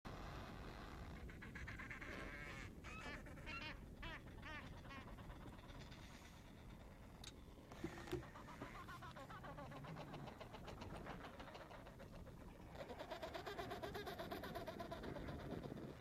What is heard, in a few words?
adelie penguin colony